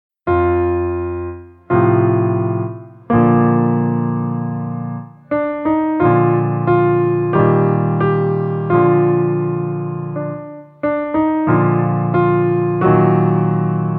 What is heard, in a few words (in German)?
Lustig oder traurig, Viola; Fiedel Max 3 Viola